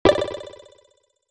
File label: splash